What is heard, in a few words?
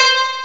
unknown koto high; tumbledemerald